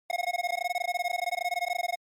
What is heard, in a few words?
NES RPG Message